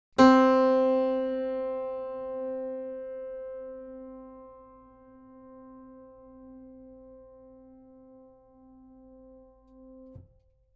Pf, 打弦; C4; damper; 弦鳴楽器

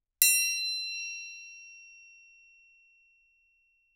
トライアングル; 体鳴楽器; 打奏

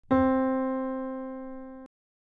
打弦, 弦鳴楽器

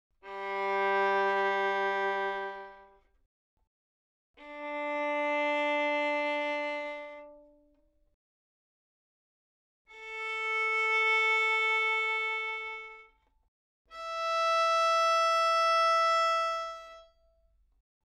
ヴァイオリン, 擦弦; 弦鳴楽器; 開放

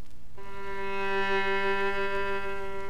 ヴァイオリン時間逆転音 G3; 持続音

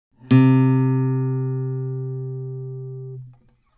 撥弦; 弦鳴楽器; C3

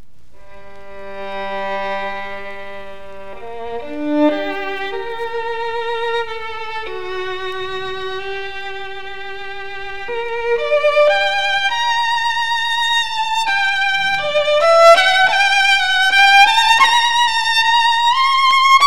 Stradiva, 擦弦, 弦鳴楽器

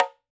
大鼓; 膜鳴楽器; 打奏; 大鼓と小鼓

